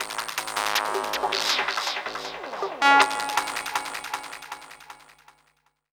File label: Stutter Synth; Jungle; Riffs, Arps & Hits; Blu Mar Ten; Jungle - 1989 to 1999